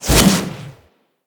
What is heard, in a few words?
Melee Jump Slam Short Charge; Whooshes